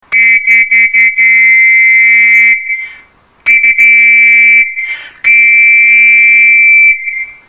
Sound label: scout whistle